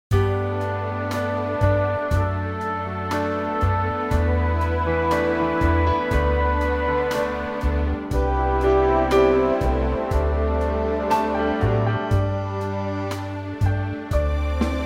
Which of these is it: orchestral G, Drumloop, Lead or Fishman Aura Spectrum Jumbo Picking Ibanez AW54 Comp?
orchestral G